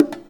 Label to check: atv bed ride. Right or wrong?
Wrong